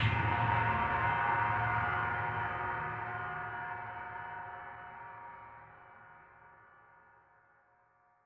drumsplosion smoosh

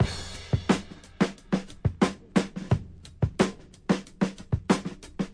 hitormiss, 090 bpm, drum loop

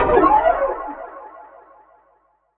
echo electronic talk

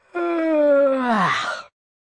male nice long yawn; MALE MISC and SPOKEN WORD

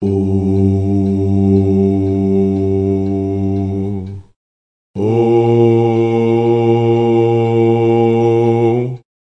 low voices male, Male Oh

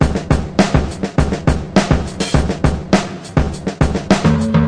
oldskool basic, 103 bpm, drum loop; 101 to 110 bpm

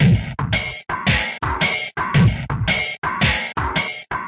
shuffle of doom, 112 bpm, drum loops and breaks

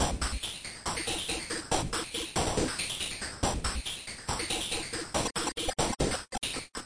noisespazz, 140 bpm, drum loops and breaks

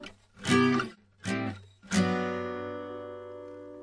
125 warm lovely guitar 3 chords

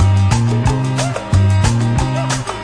182 brazil groove, sampled music loop; SAMPLED MUSIC LOOPS

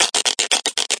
Bathed Guiro Found Percussion; 074 to 128 bpm; DRUM LOOPS and BREAKS

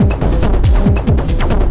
140 bpm, head going round, drum loops and breaks

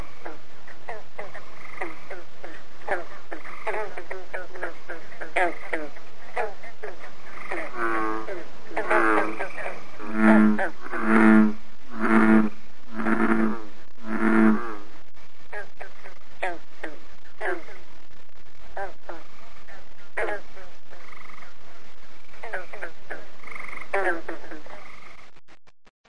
pond; Zoo and Nature

